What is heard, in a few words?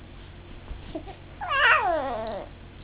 cat